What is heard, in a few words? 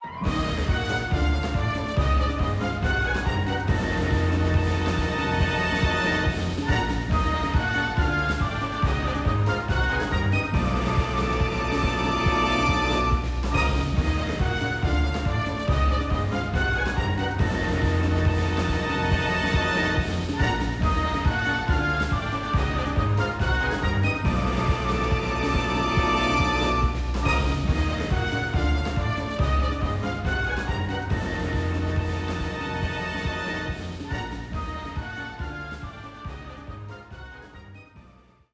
Lose; Capcom Fighting Collection 2; PS4, Switch, Windows, Xbox One